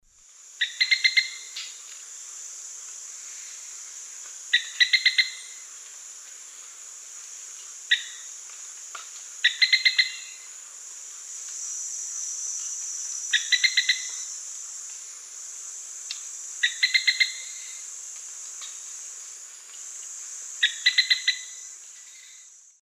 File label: Hemitriccus zosterops, calls or song; S. America; Eastern Lowlands